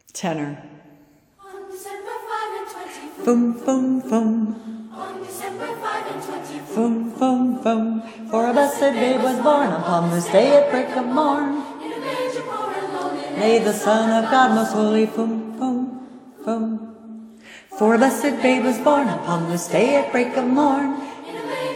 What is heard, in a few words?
fum fum fum tenor